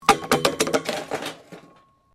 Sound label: Plastic Pole Crash; Materials; Material components for constructing more complex sounds